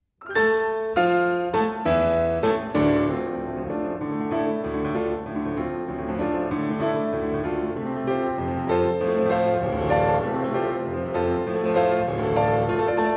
Piano Sonata No.1 in D Minor, Allegro Molto; Op. 28

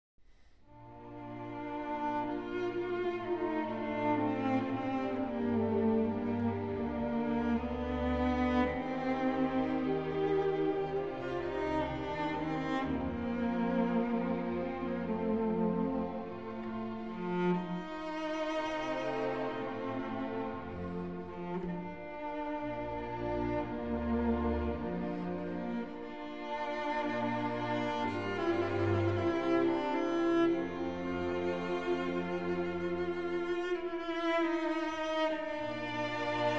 Cello Concerto in G Major, Romance. Andantino

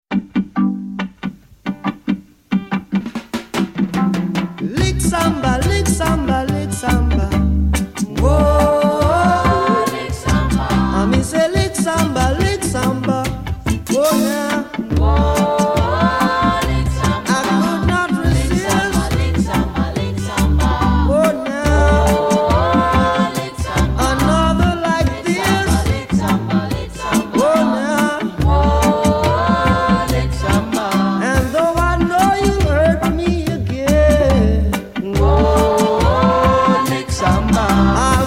Samba

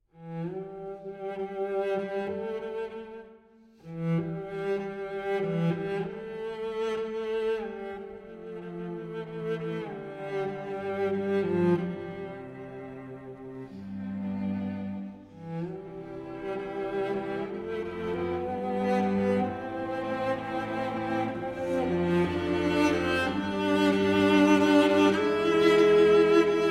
Cello & Strings

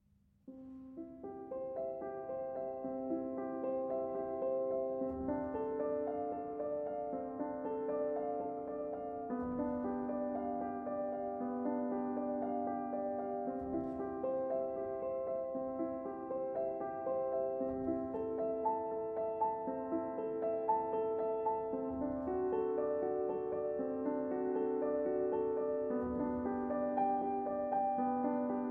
Prelude & Fugue in C Major, BWV 846 I. Prelude

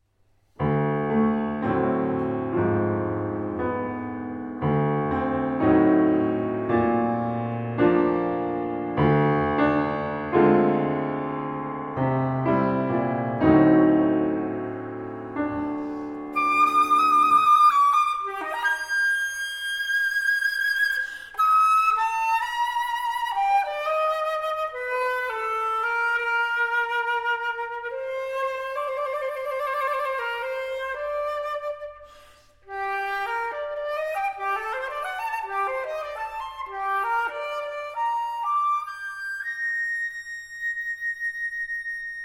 Sonata, Recitativo-Fantasia; FWV8, CFF 123

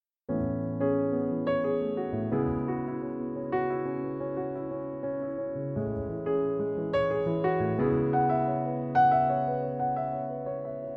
Piano Version

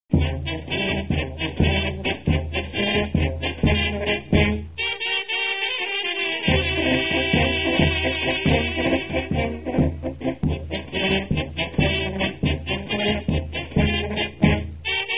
Brass Band Chochek

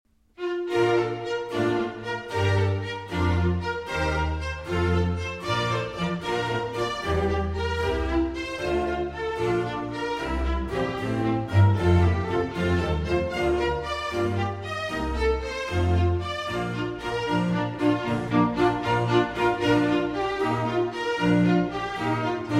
Concerto in B-Flat Major, Allegro; Brandenburg Concerto 6; BWV 1051

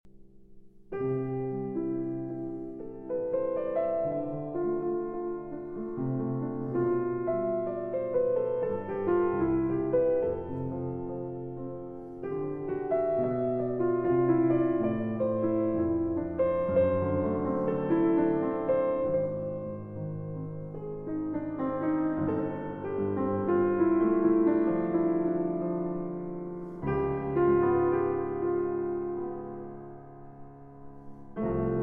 Piano Solo, Walzer; Three Pieces For Piano Solo, Op. 204 II.

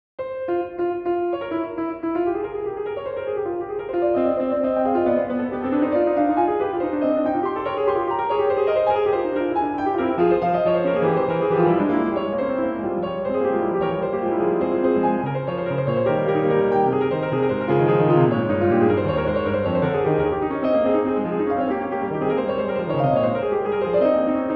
Fugue No. 12 in F Minor; Well-Tempered Clavier, Book II; BWV 881

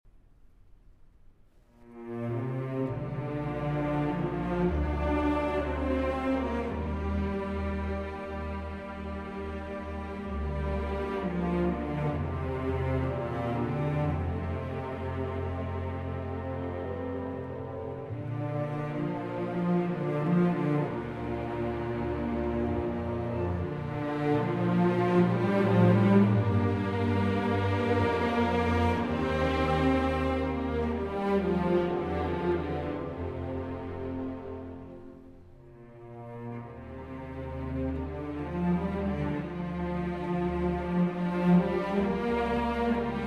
Concerto For Piano & Orchestra

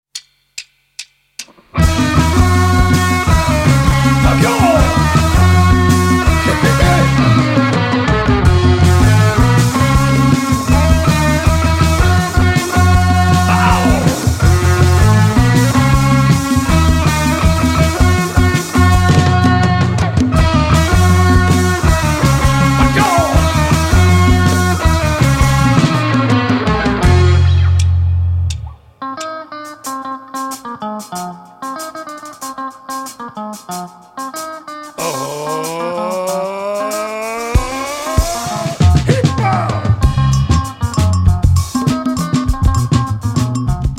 Afro Funk

